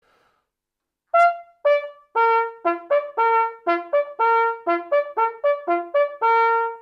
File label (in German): jagdhorn; ortnerhof